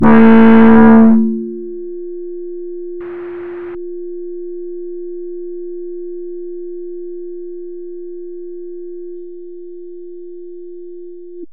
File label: Experimental Illness Epindustrial; loopzone